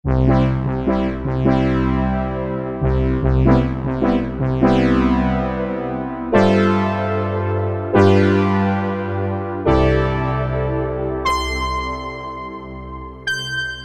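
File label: Matrix Horn brass; E-mu PK-6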